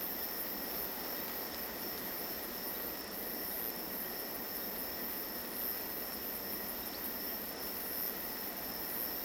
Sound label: amb forest night loop